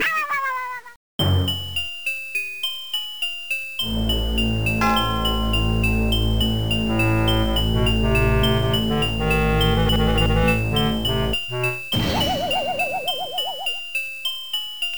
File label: Game Over (With SFX); Yoshi; GBA